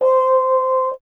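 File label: brass French horn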